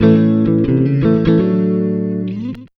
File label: Blues, Electric & Acoustic Guitar Loops